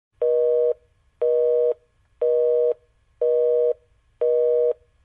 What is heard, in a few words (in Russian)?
abonent zanyat